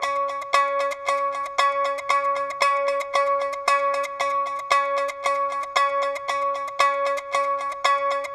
115 C- Black Fuzz

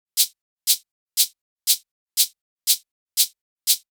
Shaker A m 120 BPM

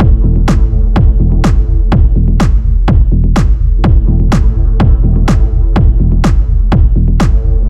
drum darkness 125

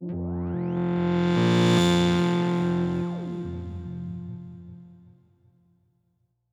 Fm Analog Fx

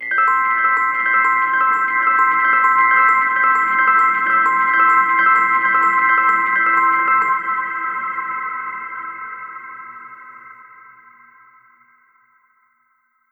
rhodes loop flutter wet 130 C

